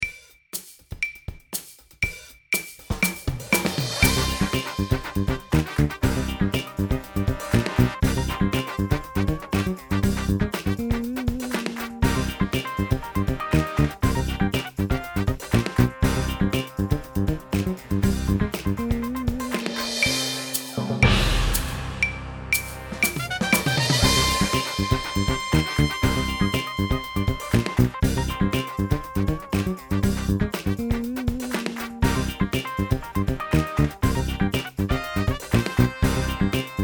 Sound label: play along 120 BPM